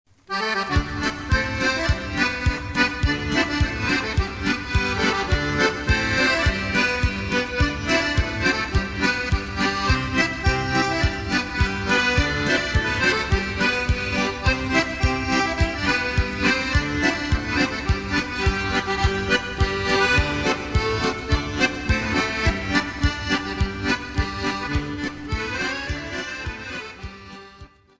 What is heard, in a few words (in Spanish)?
POLONIA Krakowiak danza popular